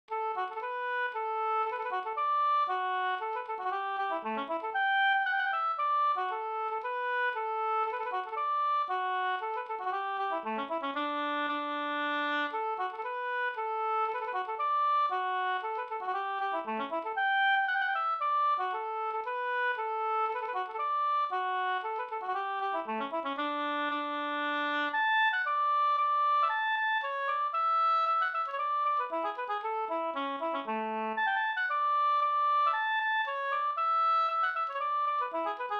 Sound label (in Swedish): polska Bingsjopolska; e Nylandspojkarna Mittemellan e Pekkos Helmer